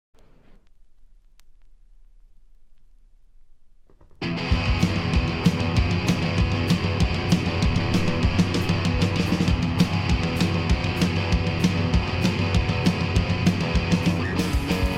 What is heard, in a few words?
Instrumental